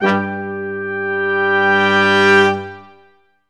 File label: BRS QUIN S, Quintet sfz; Roland L-CDX-03